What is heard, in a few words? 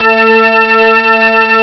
pluck organ; Amiga Soundtracker Sample Packs